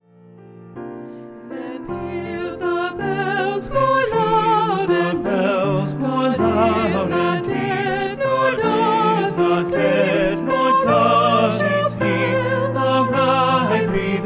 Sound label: Christmas Bells